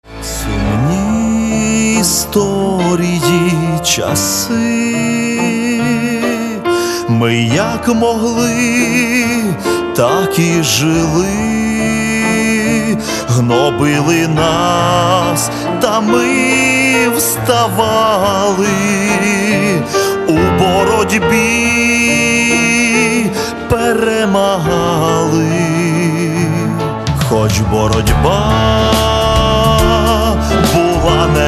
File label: Karpatskyi Chardash